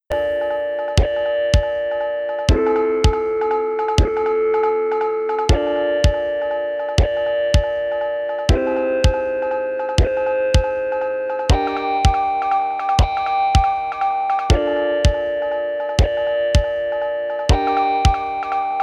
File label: Sci-Fi; Oscar; Amiga